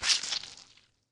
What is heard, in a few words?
sliced; spaghetti